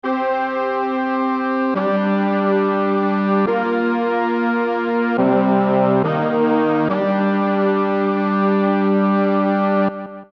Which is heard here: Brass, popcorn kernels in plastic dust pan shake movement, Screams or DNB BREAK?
Brass